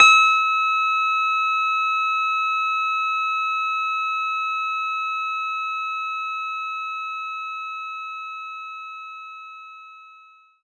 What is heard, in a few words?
Clavinet E6; Knights Try